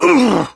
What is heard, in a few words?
Pain, Barks; Kuva Lich; Persona D